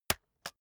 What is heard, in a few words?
keyboard external space hit slow